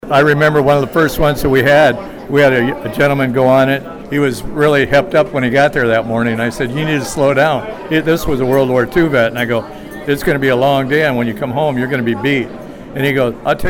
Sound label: Irish Jig